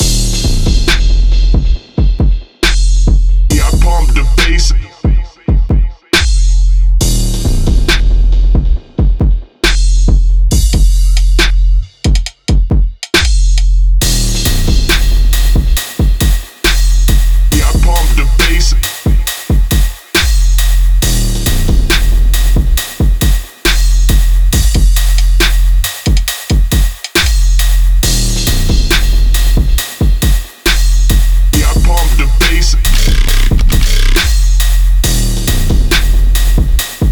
bass; weeklybeats 2024

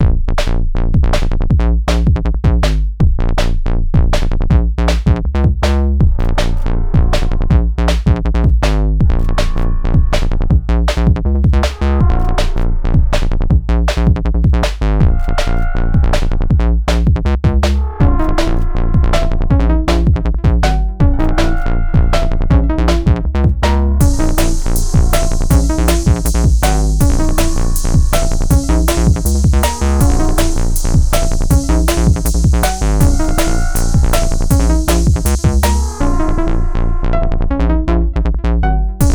more perc with the tracker

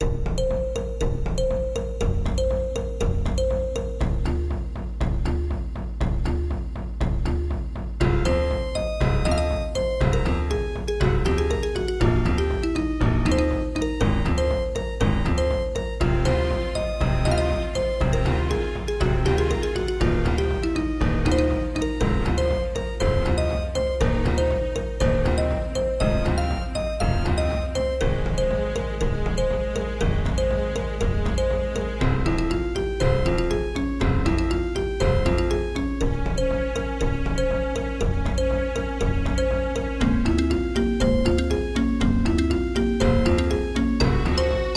scale alteration; weeklybeats-2014

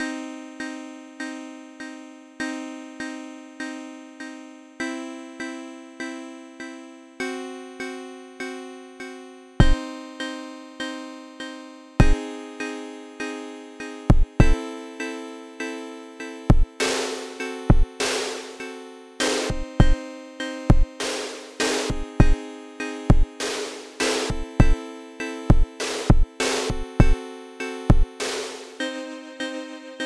dark bgm ish jam

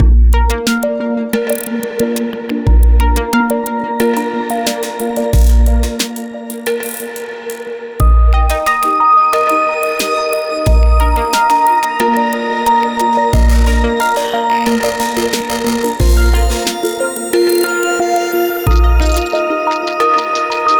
ambient electro with glitch beats

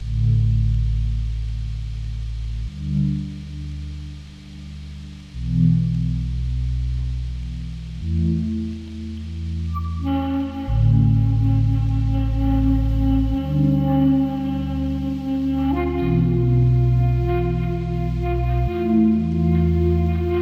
shakuhachi improv; weeklybeats 2026

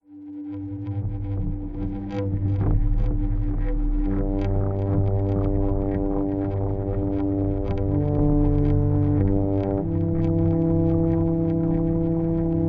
experiment in atmospherice; weeklybeats 2014

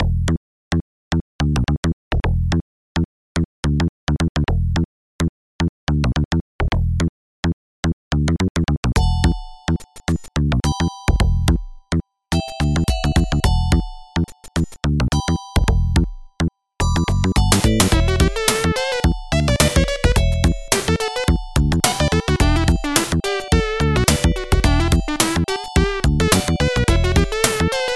wonky glunko, md sg ym2612 sn76489; weeklybeats 2026

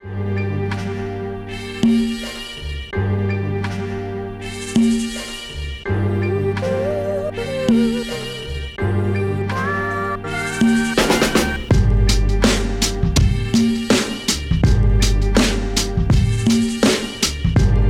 boombap beat; weeklybeats 2024

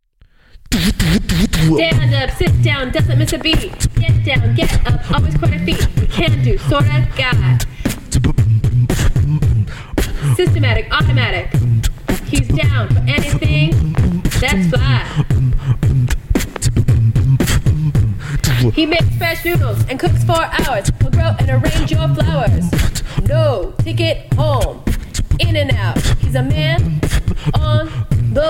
beatboxer; rebellion; weeklybeats 2012

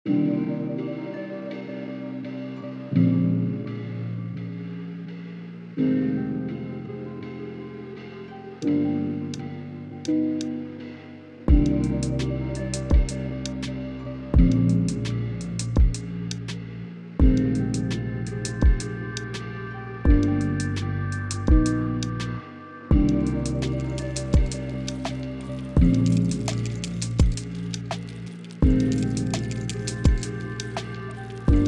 lunch fi beats; weekly beats 2020

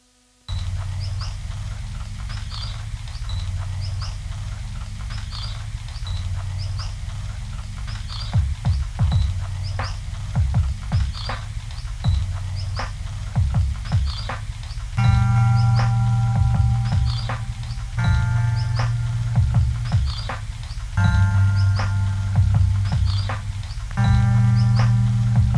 birds and rain sample and monologue chillhop; weeklybeats-2020

